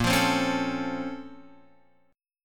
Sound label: A sharp Minor Major 9th, down, Guitar, Open F; A#mM9 strummed; JGuitar